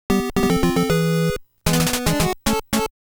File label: Fanfare Bonus Stage Start; Famicom 20th Anniversary Original Sound Tracks Vol.2